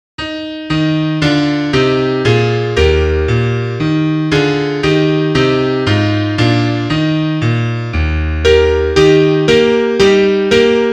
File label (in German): Klavier; kuckuck und esel